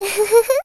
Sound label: Laugh Ghost No processing